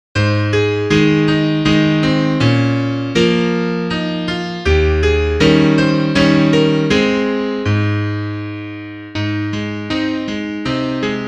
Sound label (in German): Klavier; Liederbuch; santa lucia